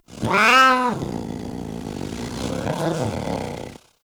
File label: Kavat Vocal Idle; Warframe; Khora